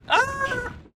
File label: Scream; Tacos